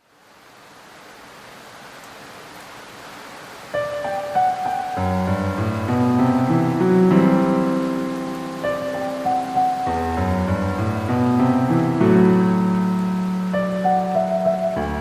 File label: Instrumental